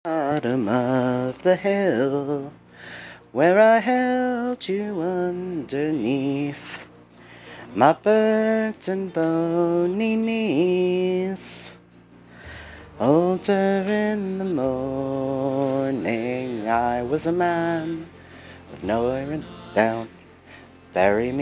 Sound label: singing